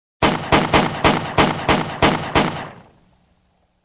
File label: Multiple Gun Shots from distance